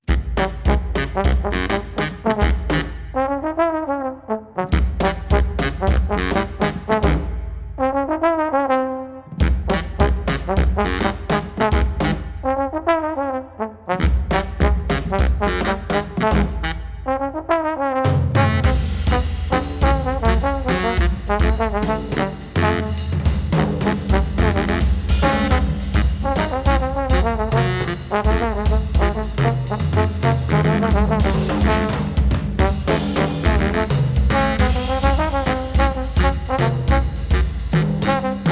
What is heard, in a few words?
brass band